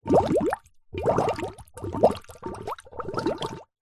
water in metal container bubbles blow through plastic flexi tube short; foley